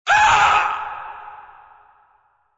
death; hl2dm

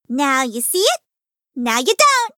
battlecry; hat kid; a hat in time

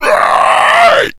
pain, slender shiver

